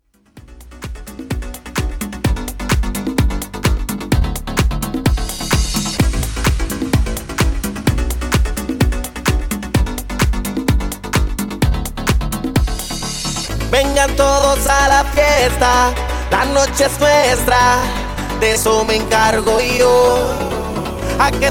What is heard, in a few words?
Urbano 128Bpm